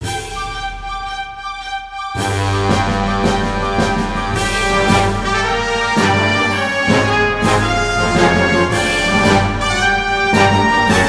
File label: Trumpet Melody; Game Music